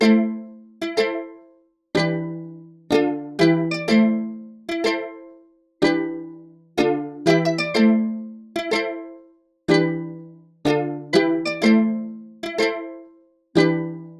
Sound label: 124 BPM A Min Guitar; Melodies; Loop Stems; Cymatics; GHOST (Beta Pack); Arsenic